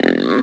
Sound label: Pig Grunt